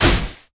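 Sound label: attack critical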